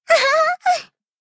laugh

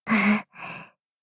laugh

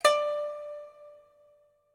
pipa

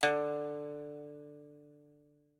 pipa

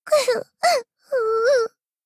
cry

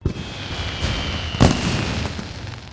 firework

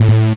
wrong

